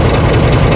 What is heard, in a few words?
squeek move; svencoop; hunger; hungerplats